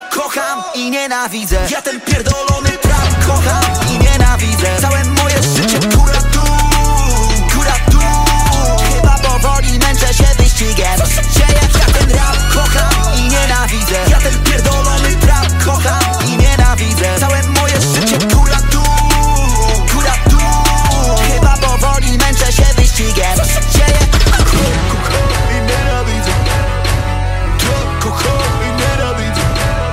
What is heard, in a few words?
rap